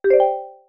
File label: marimba chord